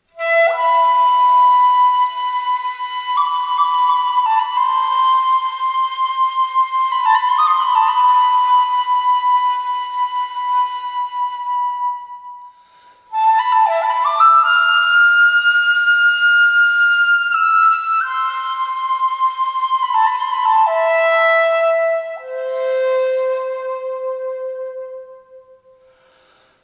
Shakuhachi Flute

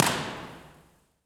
Transit Center, Impulse Response; EchoThief Impulse Response Library

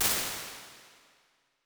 Large Plate, Lexicon 480L Reverb; impulse responses; Big Gee's

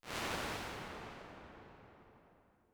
Large Church, Lexicon 480L Reverb; impulse responses; Big Gee's

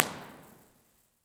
Library, Impulse Response; Love; EchoThief Impulse Response Library

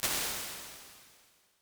Fat Plate, Lexicon 480L Reverb; impulse responses; Big Gee's

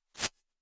BackSlap, Lexicon 480L Reverb impulse response; Big Gee's

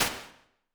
Small Ambience, Lexicon 480L Reverb; impulse responses; Big Gee's

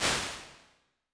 Music Club, Lexicon 480L Reverb; Big Gee's

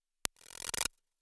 Rebound, Lexicon 480L Reverb; Big Gee's

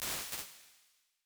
Ricochet, Lexicon 480L Reverb impulse response; Big Gee's

